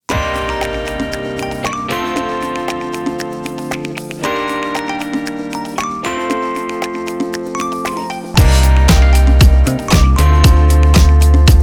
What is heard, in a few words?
Funky